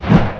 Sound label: whoosh, strider; hl2